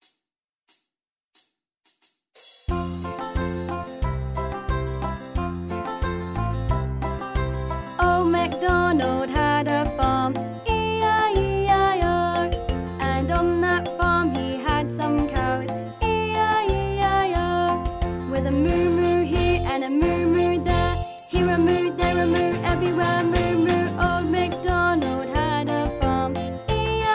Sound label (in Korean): 영어 여 여아 노래 Old macdonald